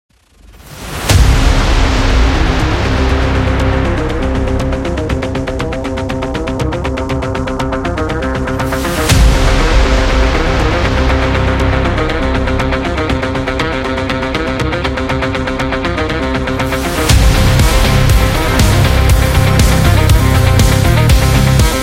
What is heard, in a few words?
Action; sport rock